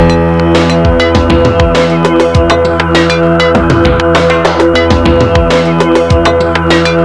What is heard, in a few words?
Seq Melody